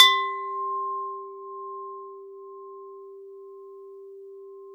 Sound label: TUB G 4, tubular bell; SoundBytes - Early Patches